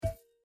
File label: Reel Stop; Holy Goat